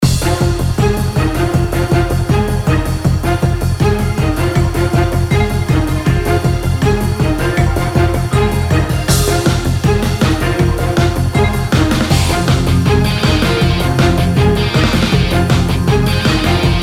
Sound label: battle